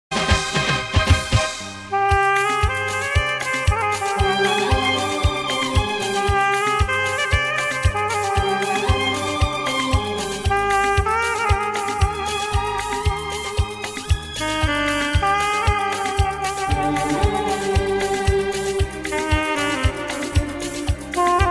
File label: Saxophone